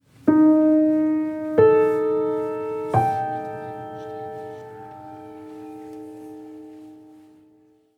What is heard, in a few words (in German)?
klanglogo